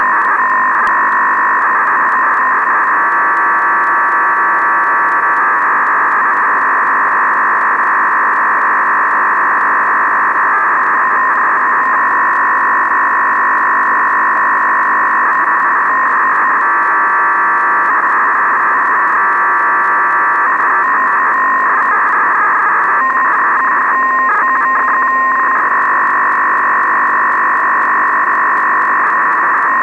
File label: ao40 beacon sound